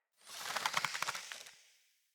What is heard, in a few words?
Hunter Bow Ranged Charge Super; Weapons; Player; Bows; EnvoyHunterBowRangedMelee